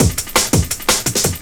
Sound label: Break, Jungle; Breaks; Blu Mar Ten - Jungle Jungle - 1989 to 1999 Samplepack